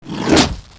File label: Greater Basilisk Defend Sound; Heroes3